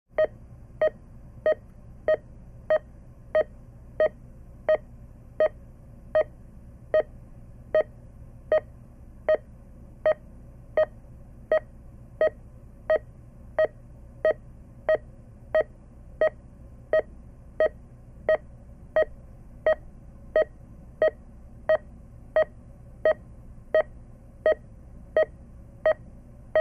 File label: Heart Monitor: Hospital Machine With Beeps.; heartbeats